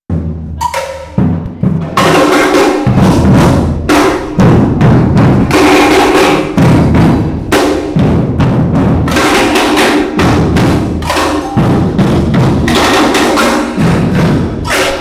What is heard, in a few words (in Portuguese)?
Probe Batucada; Brasil; Fieldrecordings